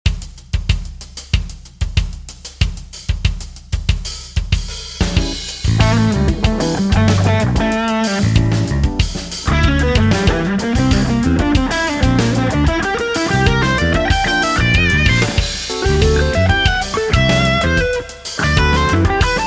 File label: Creative Rock; Guitar Techniques 2019 Issue 297 July